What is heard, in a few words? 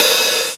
mellow open hat sound e key